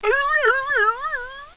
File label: yelp, animal, pain